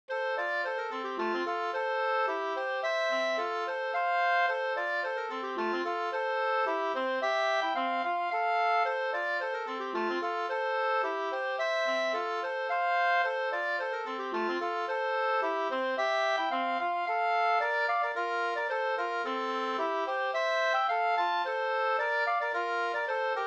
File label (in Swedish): polka Norsk pariserpolka, 2st